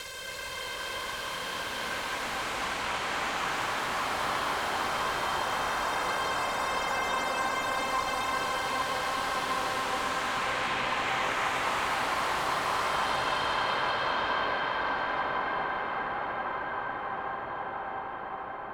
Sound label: Overlay Dark